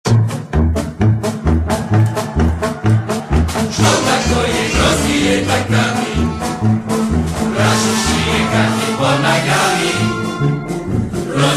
Chanson enfants de Grozny

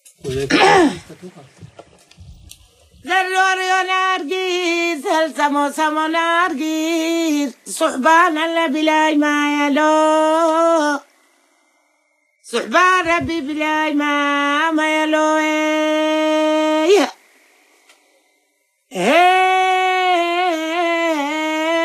Chant ethiopien

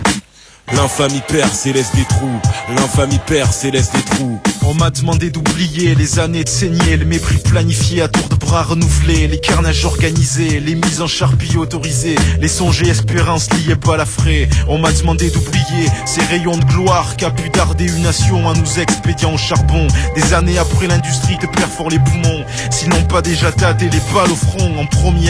Chanson

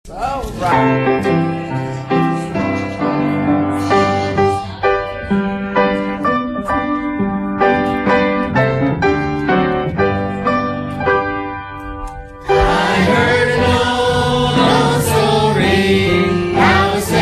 Chants religieux

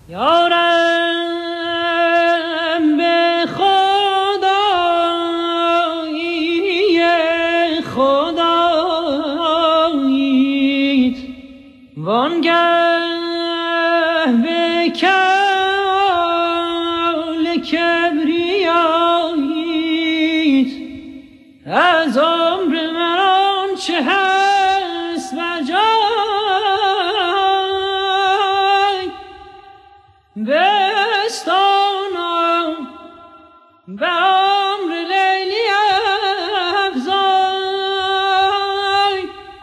Chant arabe

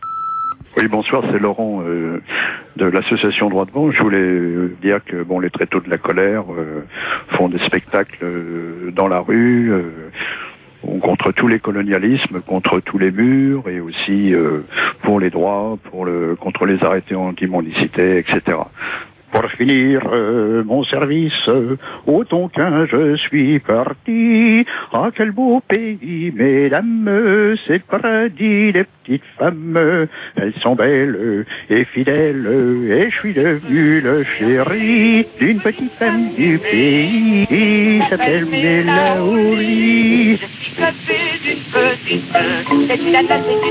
Chanson coloniale, repondeur

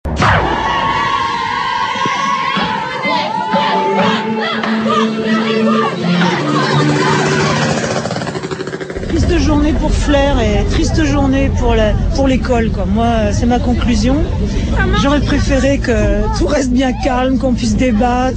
Manif contre le voile ambiance

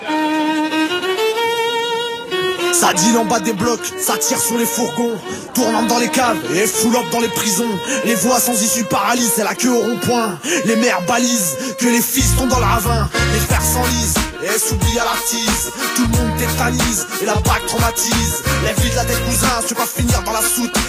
Chanson rap

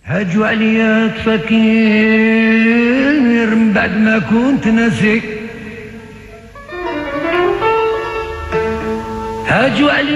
Chanson arabe

